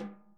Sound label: SNARE, Interactive Drum Kits